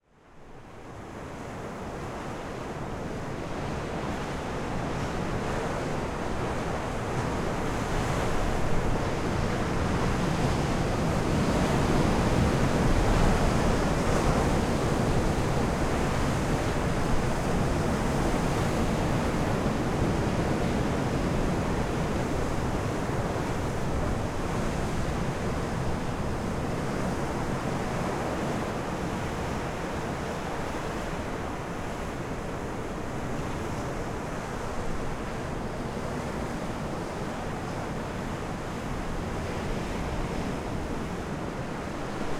Sea Waves Middle Distance Beach Rough Winter North Black Sea Bulgaria; Seaside Nature And Waves